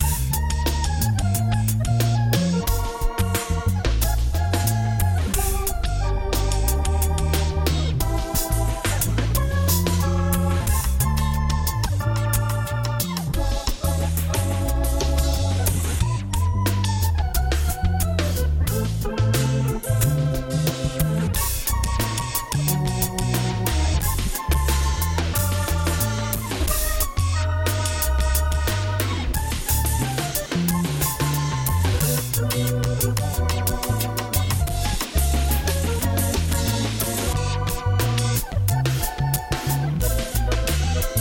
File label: Chillout 4-4 90bpm